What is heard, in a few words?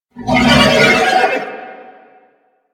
Horse Vocal Screech Stopping; Warframe; Vehicles; Duviri